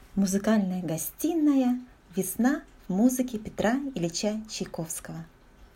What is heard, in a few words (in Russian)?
фортепиано